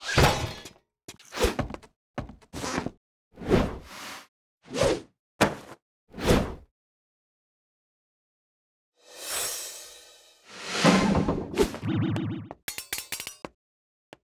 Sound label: SFX